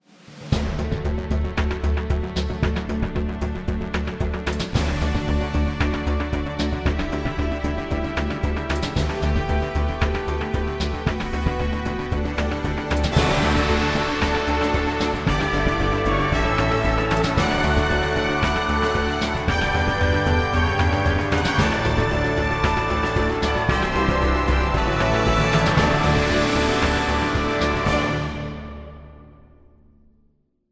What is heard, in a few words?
stock music